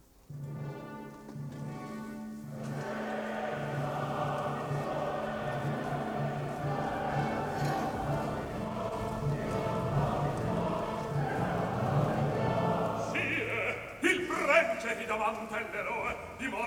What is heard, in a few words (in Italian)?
Coro e Recitativo Allegro vivace; 50 YEARS - Grosses Festspielhaus Salzburg; Mozart; Idomeneo, re di Creta, K.366; Act 3; Stupenda vittoria; Fricsay